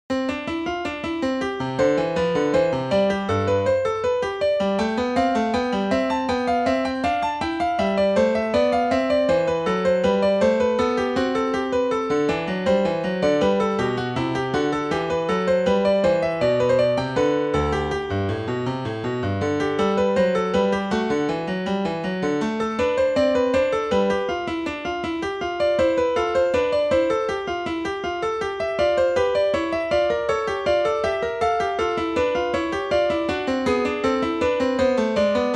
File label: invention equal, piano